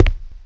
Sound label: Walk Ceramic; Player; Footsteps